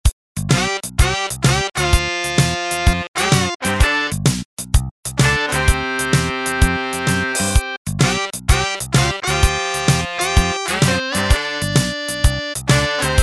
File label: song intro; SimCity Classic for Windows 2.0